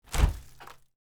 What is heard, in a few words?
freezer open